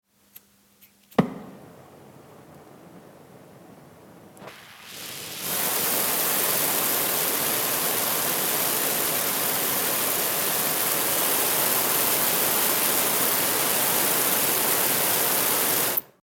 Brenner

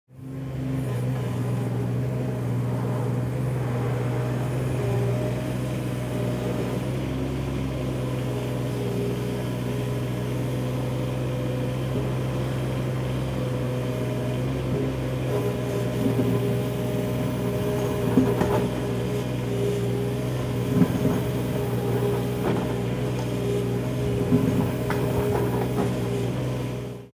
bagger

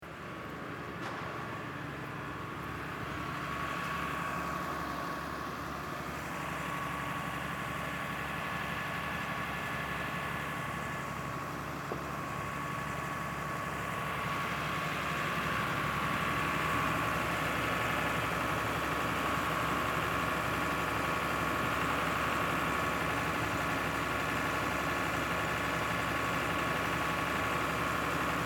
Silvester Feuerwehr

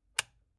Schalter

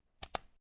kueche schalter

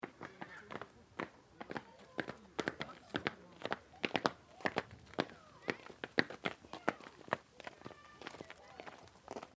Fussball Schritte

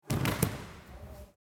Judo Wuerfe

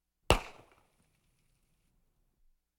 Waffen Grosskaliber Gewehr 45er Auto 1 Schuss

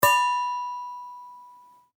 Metall

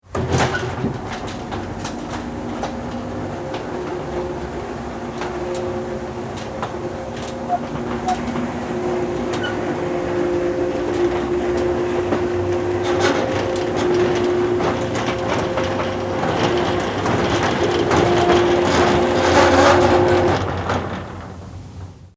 Lagertor oeffnen

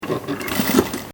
Naehmaschine

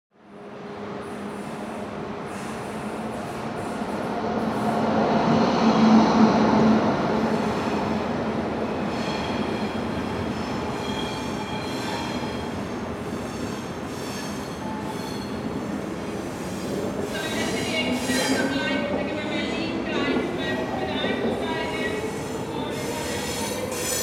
Bahnhof Einfahrt Ausfahrt

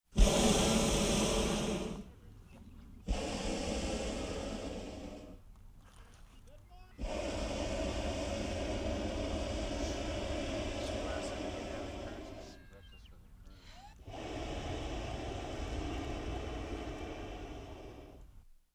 Aufsteigender Heissluftballon; Sport

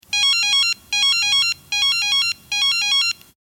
Pieper

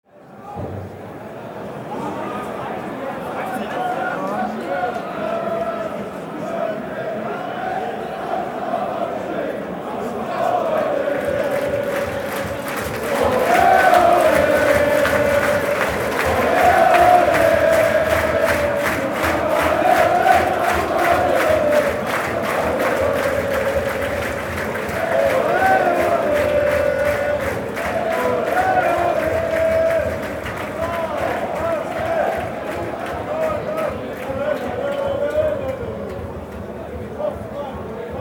Stadion Ole ole

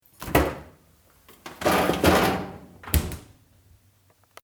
Kuehlschrank legen

